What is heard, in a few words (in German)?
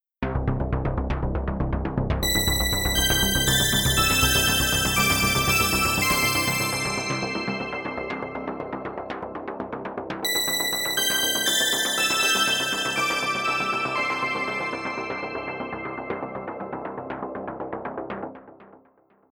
Keys diverse EQ Shapings Pan LR